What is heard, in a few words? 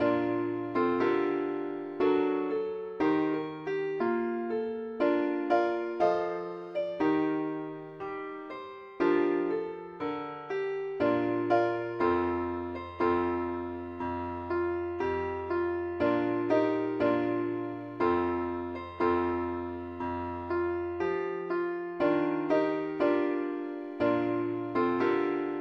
pilot ukelele keyb